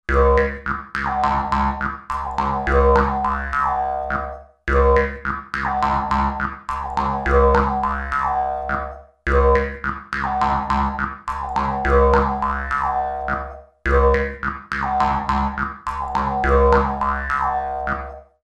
Jawharp Stroke Machine

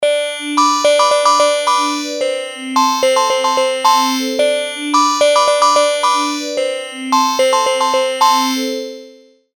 Sweep

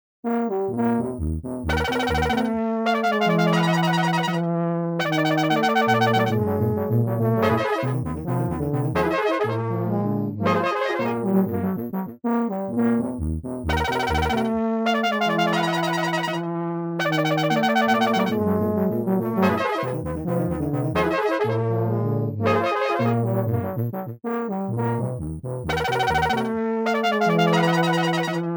horn winding down

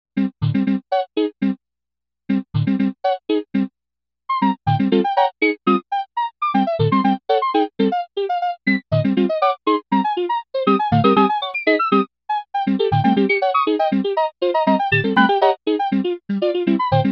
Resynth fantasy

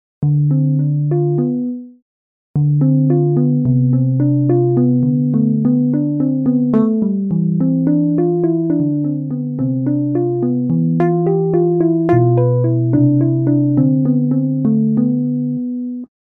Harplike

